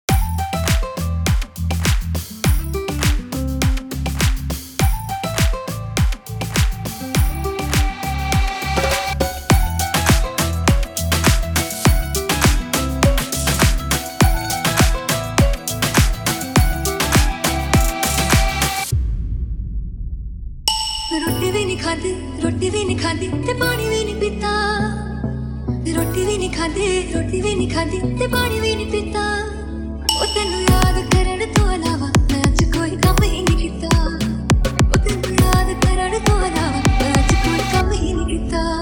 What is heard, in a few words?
Remix Dj